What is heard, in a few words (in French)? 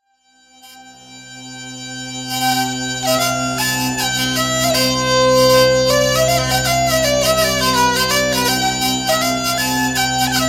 bourdon vielle